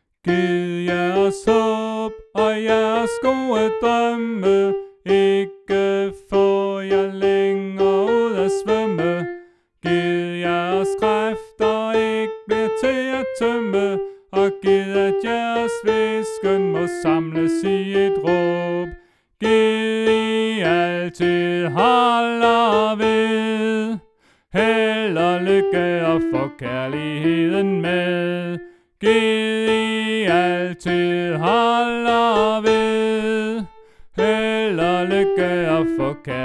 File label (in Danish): sopran